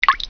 water drop; Grounch

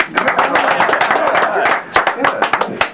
CLAP MED

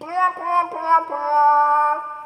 horn; Paparazzi - Tales of Tinseltown; Activision

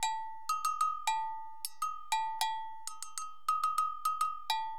AGOGO, Perc; LiveAct Pool; AcidJazz Funk

